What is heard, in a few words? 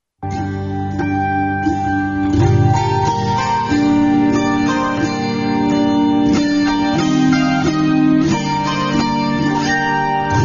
Nice Instrumental